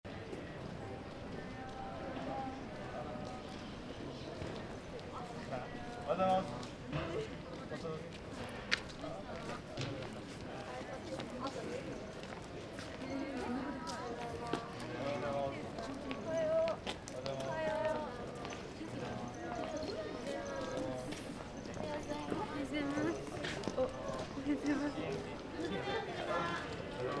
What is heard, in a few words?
NTG2